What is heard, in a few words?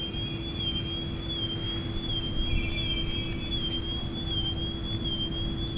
Swinging doppler